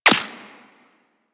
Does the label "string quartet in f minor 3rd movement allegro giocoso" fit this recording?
No